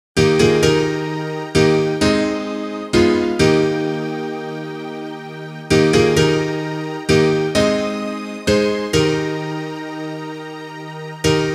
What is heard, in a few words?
instrumental